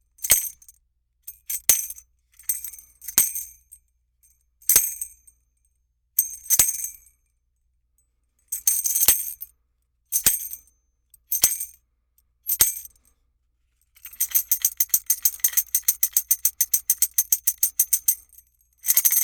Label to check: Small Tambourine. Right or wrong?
Right